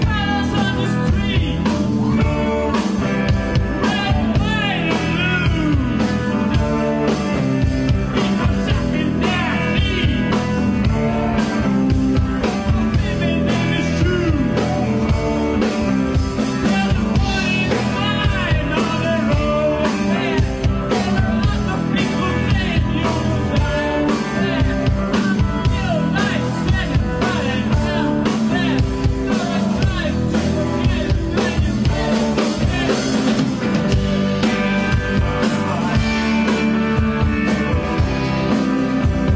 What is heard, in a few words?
GUITAR IN KYIV; ANTONY BLINKEN